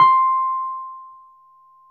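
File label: Wurlitzer electric piano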